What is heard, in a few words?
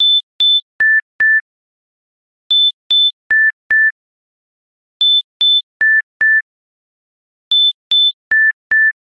Step Beep; LG Optimus L3; ringtones